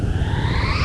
Phaser; Weapons

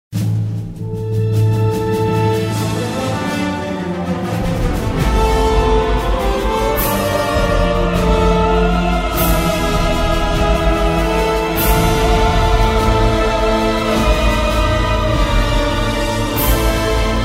Panic, Klingon

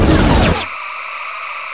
Fed Burst

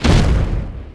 shield; NanoFXv2; ExplosionFX; ExpShield

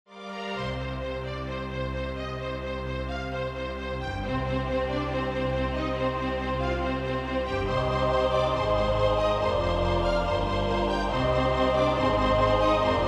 confident

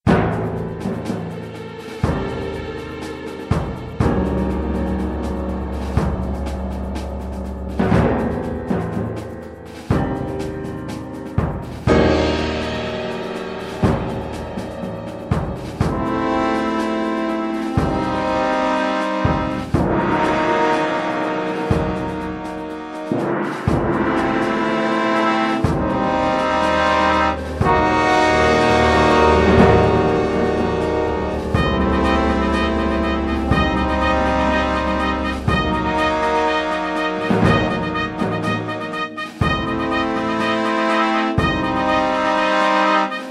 Combat Music; DS9FX

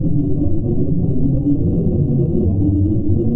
Klin Tractor

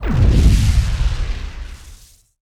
impact, Bridge Impacts; sfx

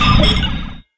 hail; Galor

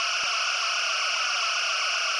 Fed Medium

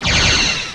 pulse phaser

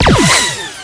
Rom Torp

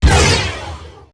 Plasma Torpedo

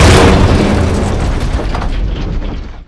console explo, Bridge; sfx

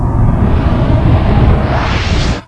Wormhole Close; DS9FX

